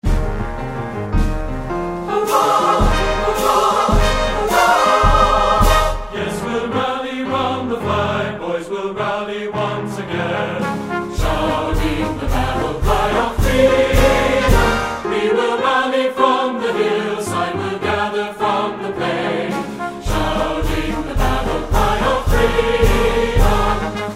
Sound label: All Parts, choir